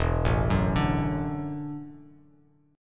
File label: E 7th Suspended 2nd Suspended 4th E down Bass Standard; E7sus2sus4; arpeggiated